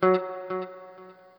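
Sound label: noodle short, guitar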